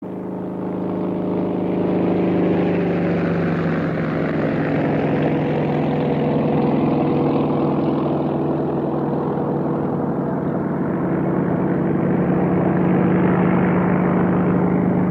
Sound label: helicopter